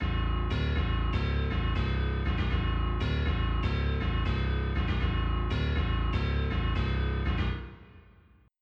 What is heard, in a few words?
loop